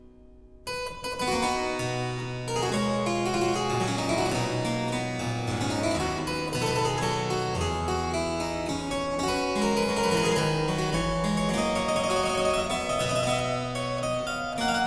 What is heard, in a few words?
Suite in C Major Courante, Harpsichord